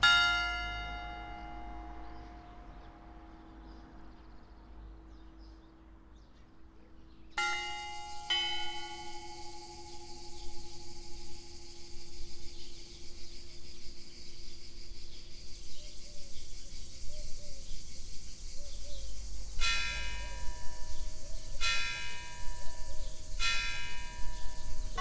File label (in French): Composition pour 12 clochers